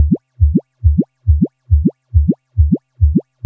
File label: Noise, LFO & Noise; Sonic Foundry (Sony Creative Software); Prototechno